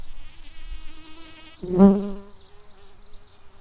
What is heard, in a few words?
bumblebees, Hypnorum workers